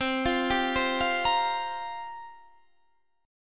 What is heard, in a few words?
C 7th Suspended 4th, Guitar, Half Step Down